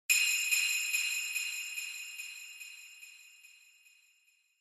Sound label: Bell Accent Delay